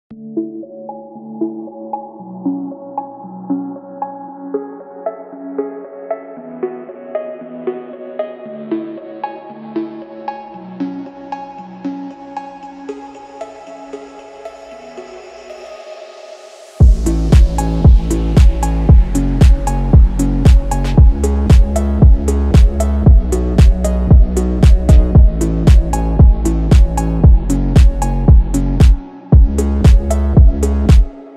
shootout phonk

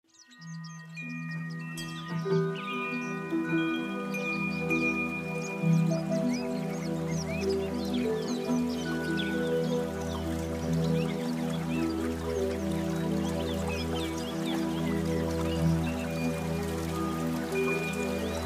nature and birds music; juda chiroyli manzara

